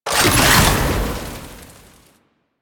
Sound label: Alchemy Grenade Deposit Fire; Warframe; Entrati